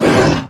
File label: Monster Effort Big; Monster George